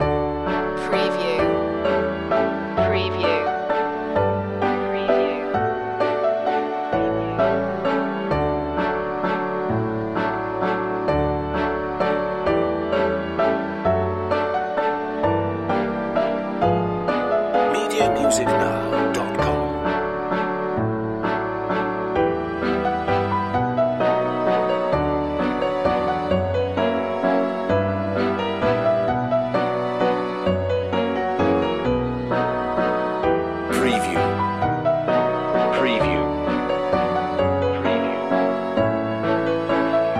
light waltz

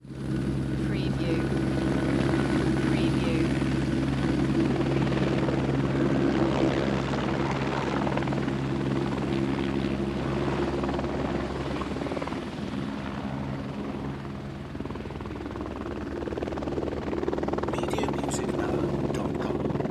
Helicopter Hover At Distance

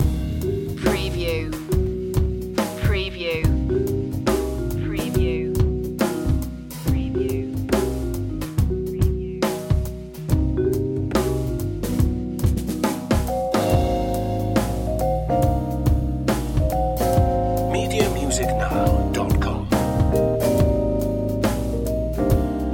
blue funk loop

